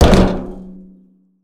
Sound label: land metal barrel, footsteps